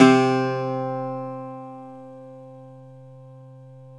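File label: bouzouki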